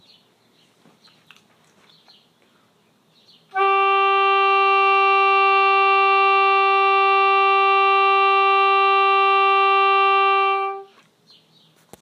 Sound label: long tone My Reed; Laubin